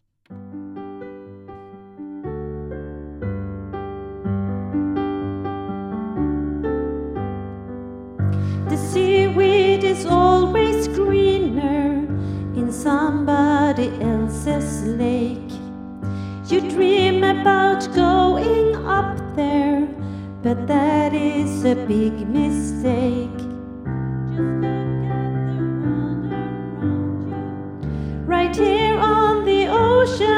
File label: alto